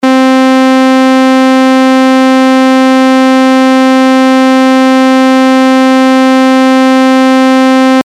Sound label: saw